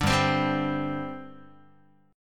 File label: G Suspended 4th G down Guitar; strummed; 5,3,3,5,4,x; 4A,4E,5A,5D,5G#,6C#; JGuitar